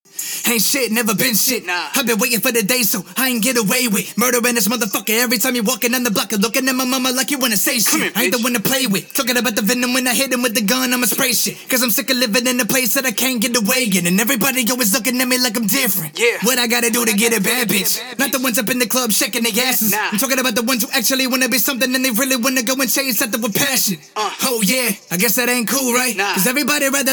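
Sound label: hip hop 120 B minor, Acapella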